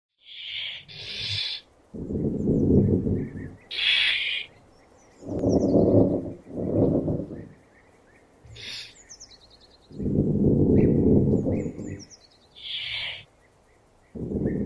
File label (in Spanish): SONIDO DE ZARIGUEYA CHUCHA